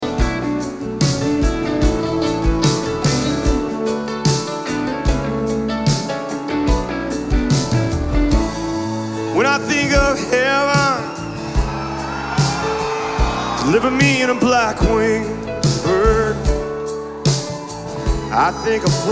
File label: Acoustic